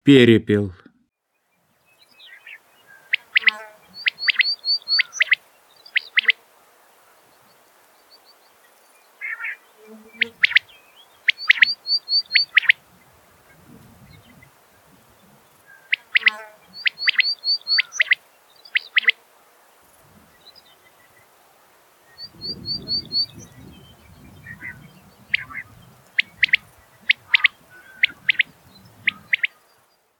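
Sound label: quail; Perepel; Golosa ptic i zverei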